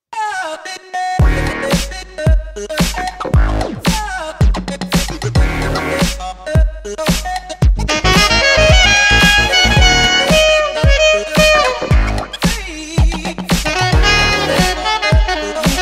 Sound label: Saxophone